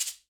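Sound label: MARACA LEFT, Latin Hand Perc; E-MU Producer Series Vol. 2; More Studio Essentials; Composer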